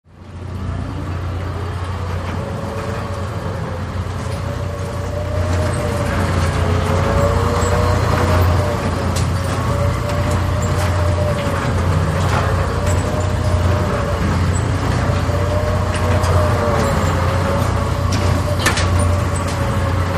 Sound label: Ride Circular Hydrau, carnival ride